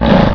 Yeti Growl; Playable Tutorial Level; TR2

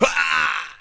Sas Death; Playable Tutorial Level

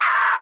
Baboon Attack Low; Playable Tutorial Level

